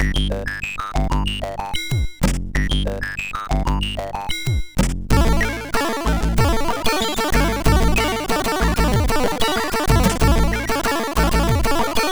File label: Chill, sid MOS6581R4